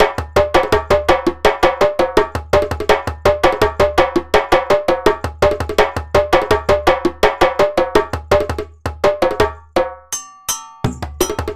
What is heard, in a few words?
gameboy break four bars; afrique